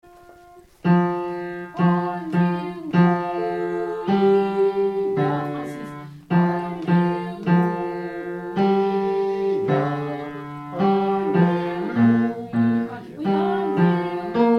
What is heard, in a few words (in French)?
Alleluia basse